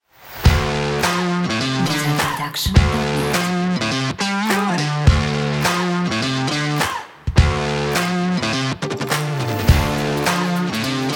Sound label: Funk Rock